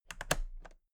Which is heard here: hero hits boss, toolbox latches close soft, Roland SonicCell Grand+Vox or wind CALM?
toolbox latches close soft